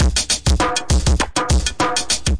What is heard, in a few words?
100 mashed, DRUM LOOPS and BREAKS; 091 to 100 bpm